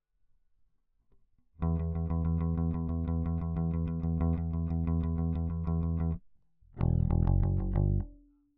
Bass

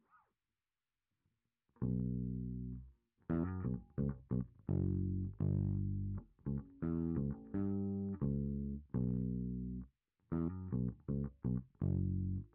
Bass Sansamp

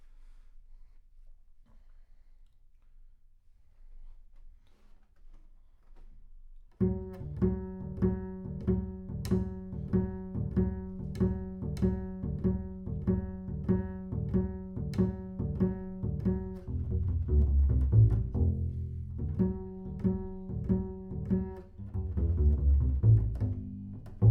Bass Room

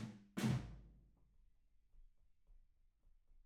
Room

